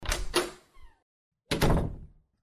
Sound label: mine door